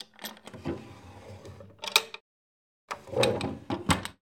Wooden Drawers, Open, Close; lilesound

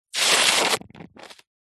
foley liquid squirt hard fast foamy frothy bubbly

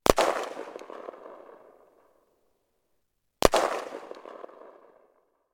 weapon gun ar 15; still north media

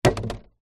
plastic milk bottle empty drop concrete; foley

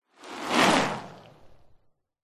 bicycle pass by fast road slightly wet; vehicles; zapsplat

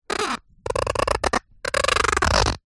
food pear rub squeak

